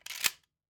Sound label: Shotgun Close; SoundsCrate